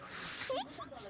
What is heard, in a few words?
hihihi